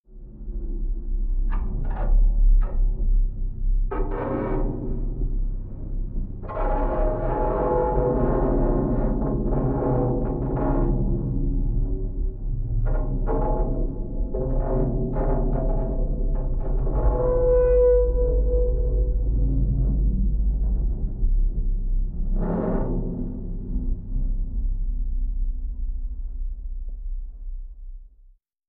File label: Underwater Metal Str, metal groan atmospheres; Underwater Metal Stress Ver 2